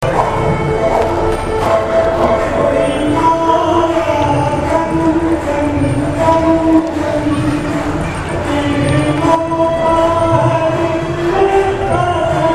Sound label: eswara temple, india; Ted P. Eugenis